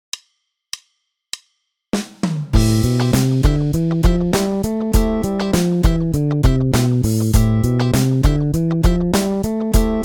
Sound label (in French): mode aeolien, basse; exercices